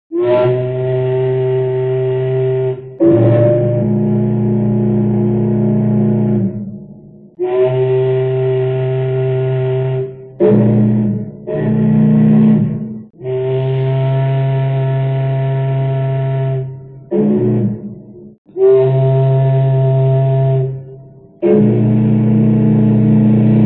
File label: Cruise Liner Horn